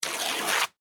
Foley Cloth Rip Fabric Tear; Ripping

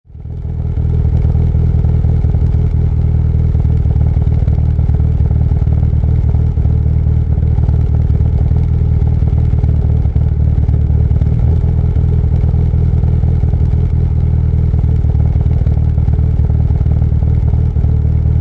Mitsubishi Evoloution Exhaust Idle; Auto, Power Car, High Performance Car Sound; Mitsubishi Evolution. Exhaust perspective. Motor idling, Idle; Race Car Sound Effects

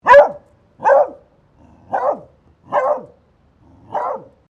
ANIMAL DOG BEAGLE FEMALE BARK; Various domestic dogs; Animals

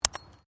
Digging Small Handheld Tool Rocks

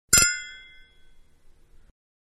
Glasses-Clinking-Loud; Clinks; Objects; Manhattan Production Music

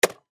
Stapler Electric Staple Paper Impact; Office; Blastwave

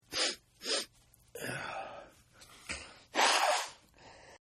Sniff Nose Blow Male; Sickness Sounds; Sniffling; Human; Sniff Nose Blow Male 2

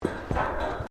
Footsteps Boots Suspended Metal Walkway Step; Footstep Foley; Sound Effects:Human

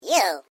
Created Creature Small Eww, Alien Sound Effects; Created creatures, vocalisations and pretend speech

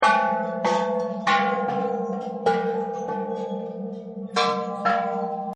Buddhist Bells; Musical

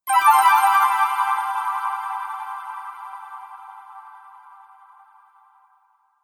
Ethereal Magic Sparkle; Magic Sound Effects

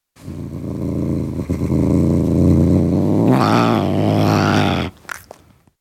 Tom cat growling, individual growls; Cat Sound Effects; Cats (Sound Effects:Animals); BBC

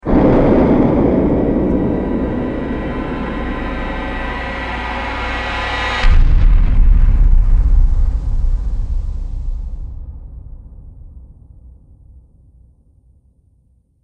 First Strike Stinger; sound design; Stinger Sound Effects

